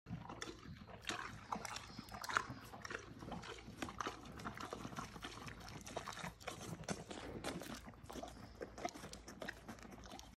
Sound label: horse chewing